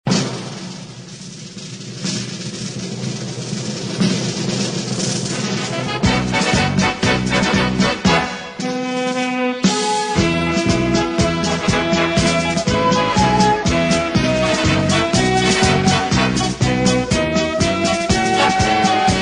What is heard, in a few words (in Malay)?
negaraku instrument